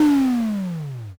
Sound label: dark magic